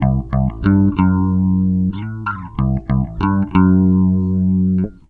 STRANG 94 C, Bass Grooves; USB Soundscan vol.30; AKAI

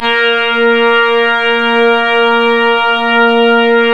ORGAN, A#; Big Fish Audio; Synclav FM

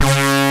ARP C#2; E-MU Formula 4000 Series Vol. 5; Protozoa

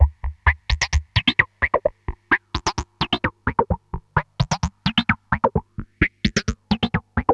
EMS LOOP; ELEC-LOOPS; Syntec; Wall of Sounds; TECHNO-BOX

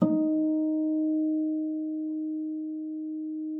FLAG D4, harp; Xsample VOL-8; HARP+FX

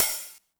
OH MOD Tune, OPEN HAT, Roland TR-909 Modded; 300 Drum Machines; Modded Roland TR-909 Sample Pack

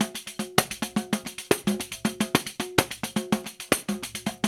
RIO, Rio Batucada; Spectrasonics; Supreme Beats; World Dance

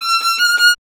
STR VLN, Solo Instruments; Miroslav Vitous

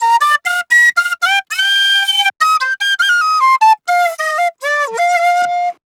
Penny 075bpm Bb, Penny Whistle, African

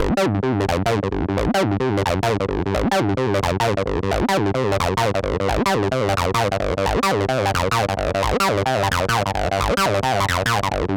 303 Impurity G; lead arp chord melody

